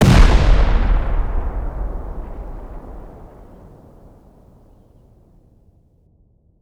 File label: c4 explosion; Rust